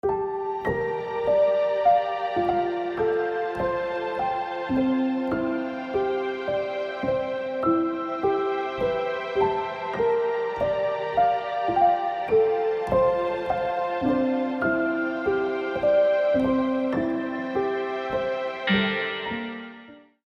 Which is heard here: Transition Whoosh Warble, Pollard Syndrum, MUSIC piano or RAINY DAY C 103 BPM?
RAINY DAY C 103 BPM